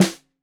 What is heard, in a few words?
Snare 65 Reg Stereo, Deeply Sampled Drums